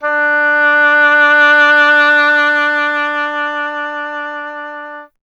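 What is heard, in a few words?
WIND OBOE; Miroslav Vitous - Solo Instruments VOL-2; Solo lnst. II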